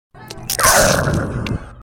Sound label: zombie attack; zombie pack sfx